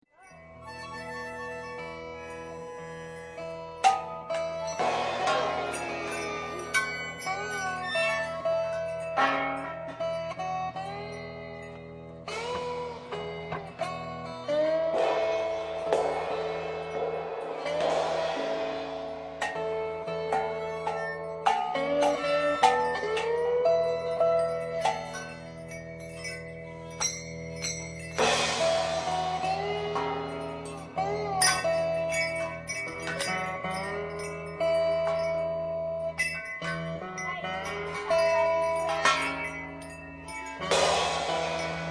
Musical Washing Line

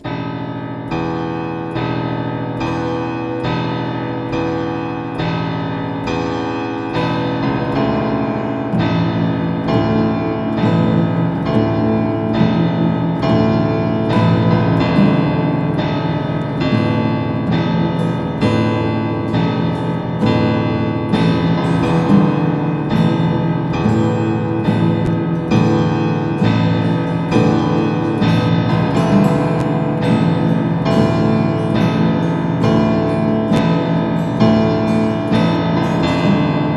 acoustic

